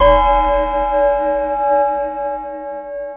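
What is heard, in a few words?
FX left, attacks; Best Service Advanced Media Trax Modern Composer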